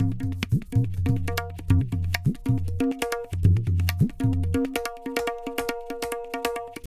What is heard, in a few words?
Tabla, 140 BPM; Zero-G; Pure Tabla